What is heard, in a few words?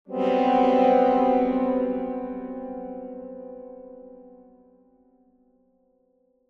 Symphonic Strings Suspense Chord - Lower; Hollywood Edge; sound designer's toolkit v2